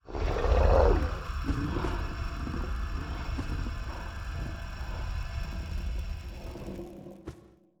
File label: dark general idle, monster